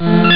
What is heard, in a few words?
elec door; ST-XX Amiga Soundtracker Sample Packs